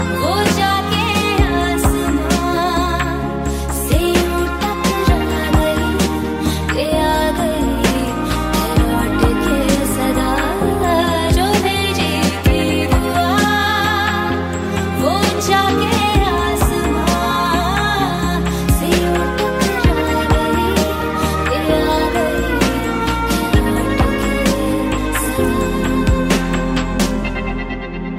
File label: bollywood music ringtone